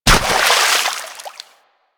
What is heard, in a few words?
Bird Of Prey Bodyfall Water; Warframe; Hunting Critters